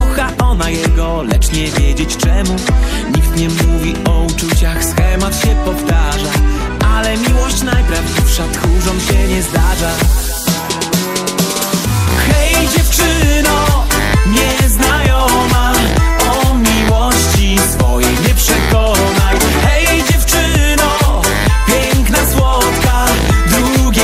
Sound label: poland music